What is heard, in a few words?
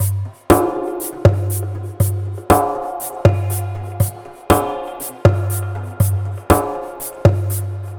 Conga Loop, Ethnic Instruments; Sonic Foundry (Sony Creative Software); The Electronic Music Manuscript Collection